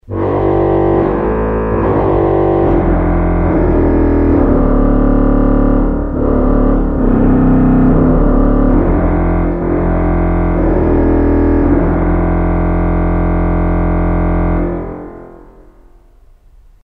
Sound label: Bassun 16; urkutieto